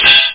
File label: SWORD; Powermonger